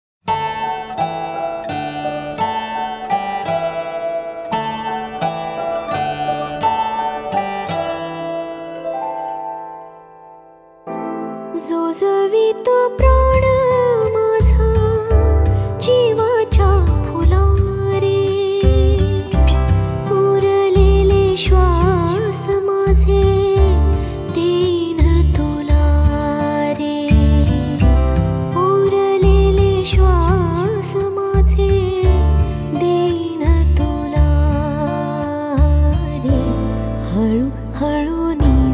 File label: Lori (Female Version)